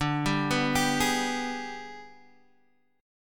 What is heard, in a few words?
Ab Minor Major 7th Flat 5th